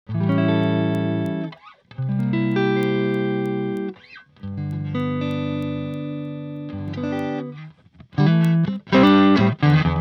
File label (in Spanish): guitarra